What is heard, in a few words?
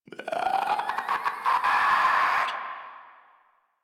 Infested Runner Chasing; Warframe; Runners